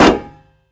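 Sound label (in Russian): короткий глухой удар по металлу тупым предметом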